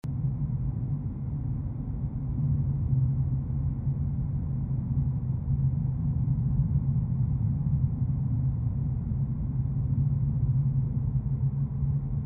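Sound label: AMBIENCE Basement Shaft Drone Wind loop; gamerip; The Unfinished Swan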